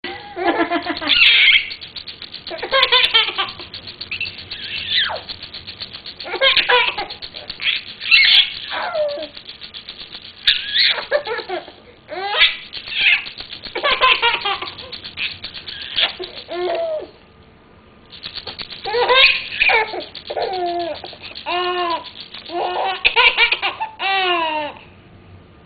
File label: laugh, Baby